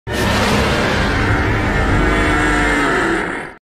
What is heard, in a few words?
Roar; Cyber-Zilla